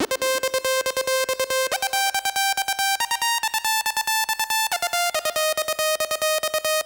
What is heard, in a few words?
Tranc, Techno Melody; TechnoMusicMaker